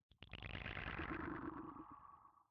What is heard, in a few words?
conduit; minecraft; block